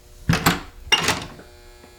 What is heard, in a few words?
Rust Handle